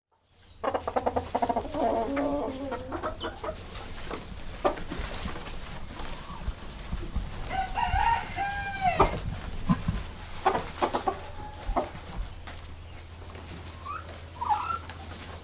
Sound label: Chickens Moving And Clucking; fesliyanstudios